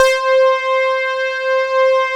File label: OB BASIC